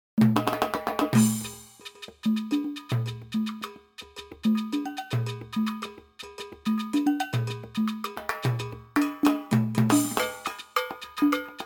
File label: world percussion; sibelius rock and pop